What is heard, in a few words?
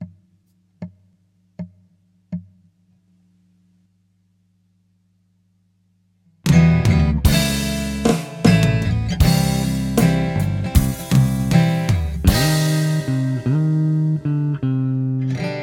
AG & DR & EB